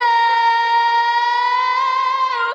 voice female going up; Loops; Phonky

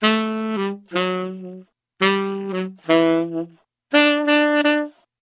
sax, Loops; Phonky

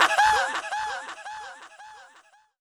ha ha

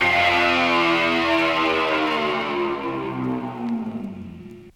down power, zaps and beeps

